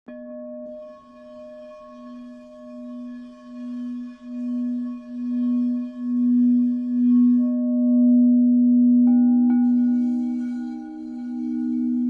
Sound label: cry bowl